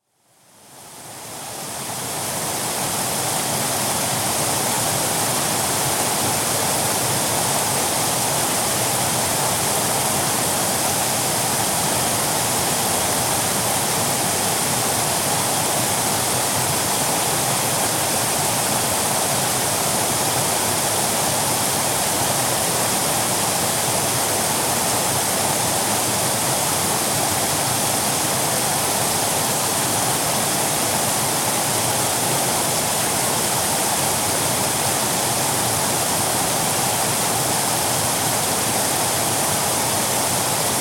Waterfall; Part of Water (Sound Effects:Nature); BBC

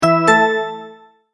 multimedia tone verified; McKinney Sound

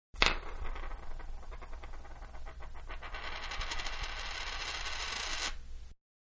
Dime Spun On Wood Table, Coin; Money (Sound Effects:Objects); Manhattan Production Music

